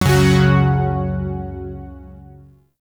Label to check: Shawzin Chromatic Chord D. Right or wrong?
Right